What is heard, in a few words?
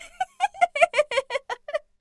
giggle fit